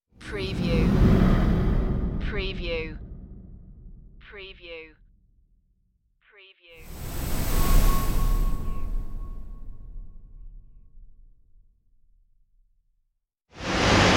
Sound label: space whooshes